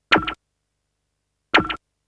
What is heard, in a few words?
FX; Multitrack